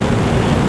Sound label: molotov fly; blockade classic